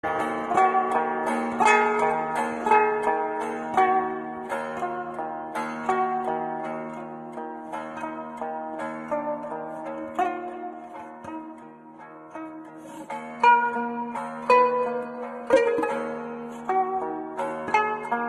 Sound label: selane